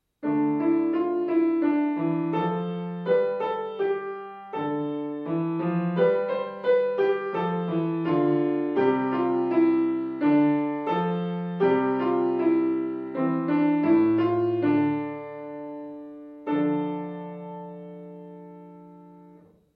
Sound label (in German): Dorische Melodie, Klavier